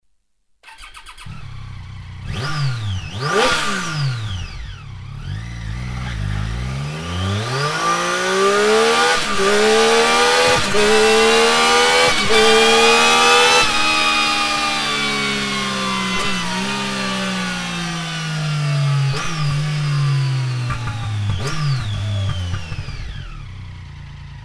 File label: slip on exhaust triumph tiger800; akrapovic; xc xcx xr xrx